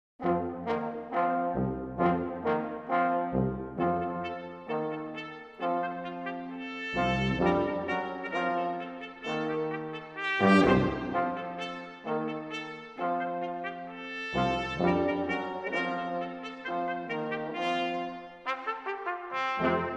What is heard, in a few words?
Jingle Bells, Brass Ensembles